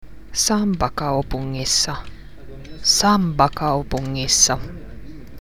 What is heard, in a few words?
samba